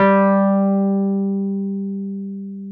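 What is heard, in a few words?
Wurlitzer electric piano; Sonic Reality; Vintage Timetraveler; WURLITZ EP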